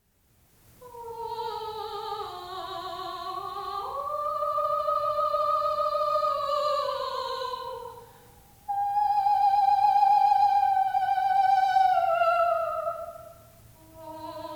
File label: Vocalise